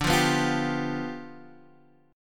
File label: G 7th D